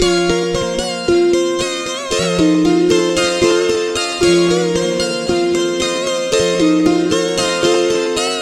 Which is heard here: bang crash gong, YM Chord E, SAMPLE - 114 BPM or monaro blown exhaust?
SAMPLE - 114 BPM